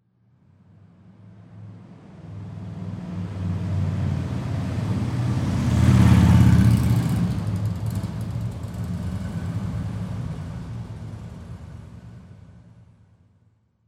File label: Transportation Auto Motorcycle Drive By